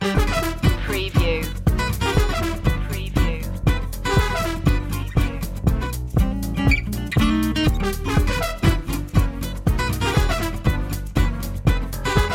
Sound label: gypsy swing loop